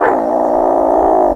growl; ahlbi

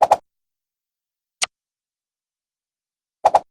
knife flip; olivia

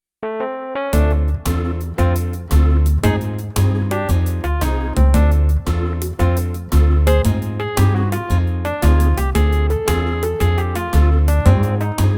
suspicious folk

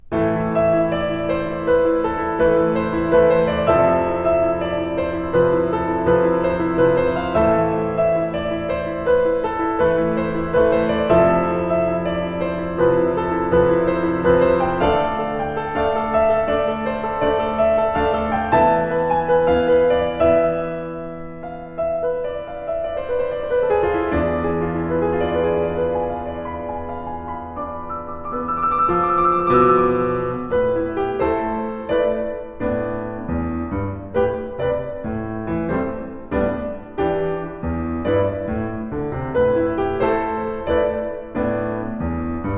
piano

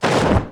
fall

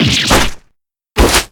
damage

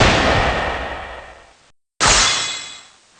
rebuttal; aai

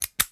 pocket watch close; pierce

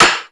desk slam; auchi

